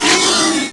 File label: Ice Elemental Hurt Sound; Heroes3